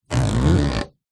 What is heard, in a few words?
foley plastic sheath metal coil stretched rub squeak; zapsplat